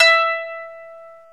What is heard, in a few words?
ETH KANUN, Eth Plucks, Saz & Kanun